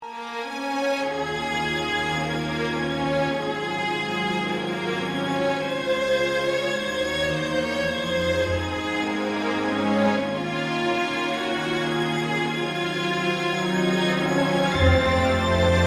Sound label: orchestra